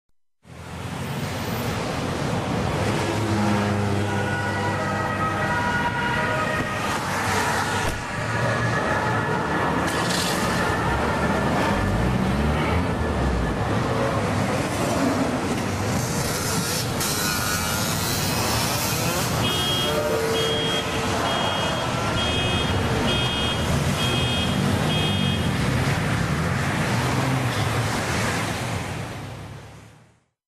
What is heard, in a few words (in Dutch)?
straat